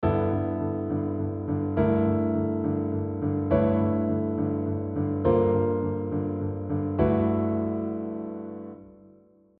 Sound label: pedal point - double